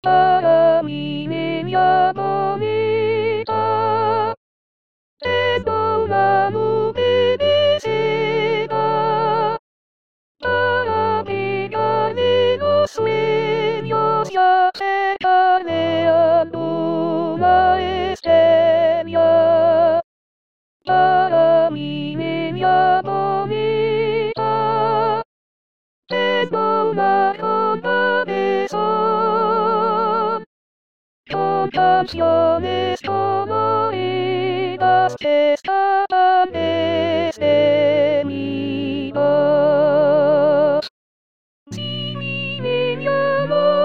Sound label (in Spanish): SOPRANOS VOZ